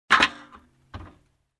Small tin hit

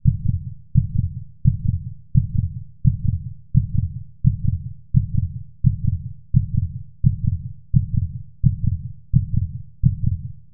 Heartbeat 88 BPM dull

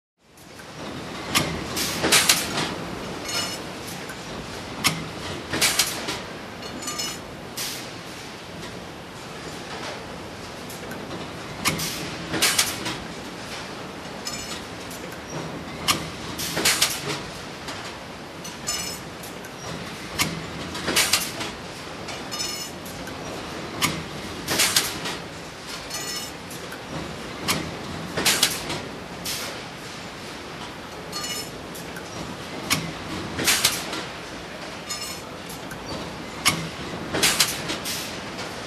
Industrial machinery being fixed

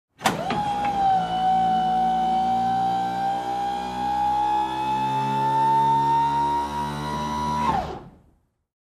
Hydraulic Machine navigation

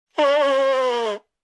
Nose Blowing

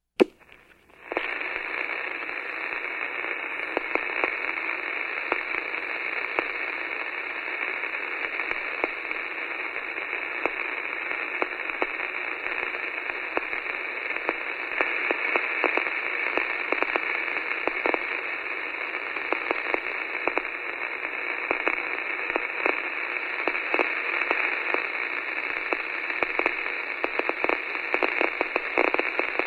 Radio no signal

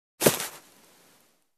Box of clothes slam

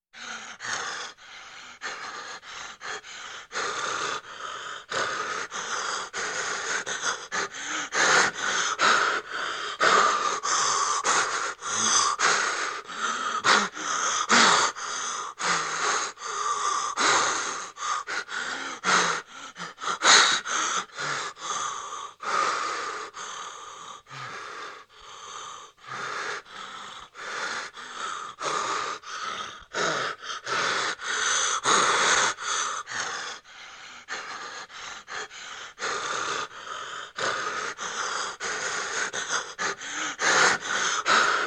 Adult Male Panting

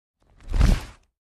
Bird wing flapped

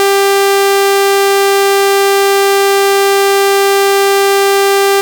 Long Bright Beep

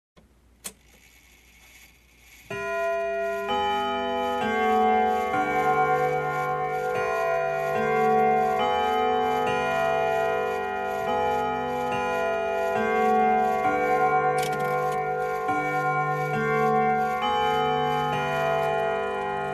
Grandfather Clock strike with bells

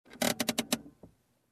Pulling handbrake

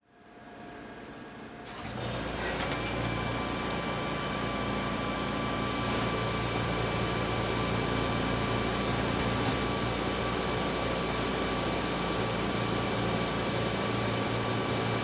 Factory Machine Turn on

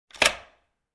Door lock click sound

